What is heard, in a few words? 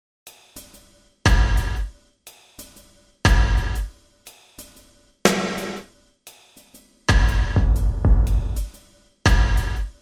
LOW END VERB GATED